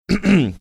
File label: clearing his throat